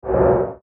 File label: Whoosh Windy Bass; Blastwave; Sound Design